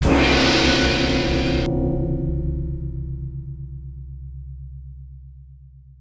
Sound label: Start; Mutant Fighter